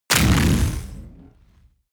sci-fi crushing burst; schusswaffen

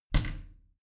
bathroom cabinet creak close regular; objekte; tueren

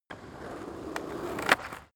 skateboard single drive fall off short; sport freizeit

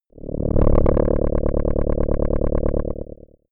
shot alien rattling; sweeps swells; comedy-cartoon; soundpool

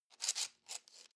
metal stripes rub short; industrie wirtschaft; metall

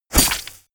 arrow hit flesh; krieg-waffen; pfeil-bogen; soundpool

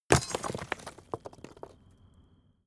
stone large fall metal; natur-tiere; steine

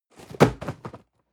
cardboard box medium fall high hard; objekte

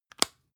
spice jar close bright; industrie wirtschaft; gastronomie

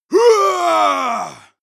male combat attack long

